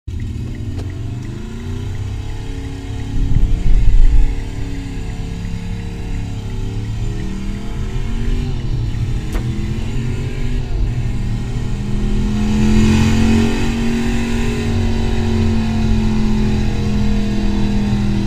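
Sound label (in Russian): nissan leaf s imitac sportcar, Nissan Leaf with sports car imitation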